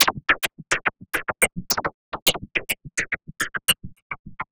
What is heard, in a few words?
Processed Perc